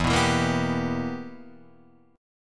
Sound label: B Major 7th Suspended 4th Sharp 5th E